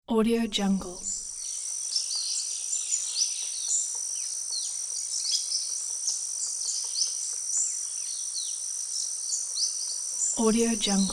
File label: forest summer day